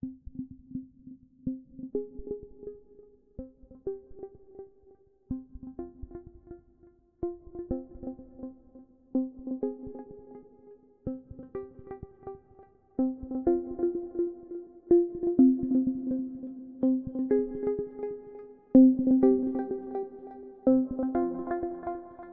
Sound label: Loading; project reality bf2 1.6